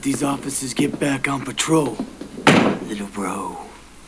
office

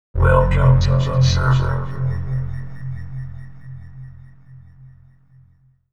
join server; valve; mapchooser; hl1

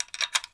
reload; weapons; valve

